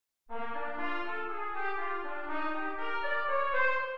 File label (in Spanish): Min7 en C